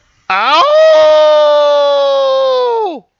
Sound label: Howl